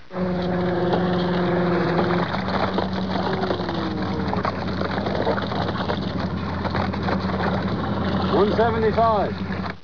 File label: noise